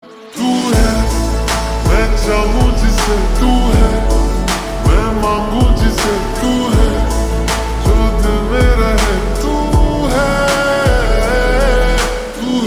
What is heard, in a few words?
Ringtone slowed reverb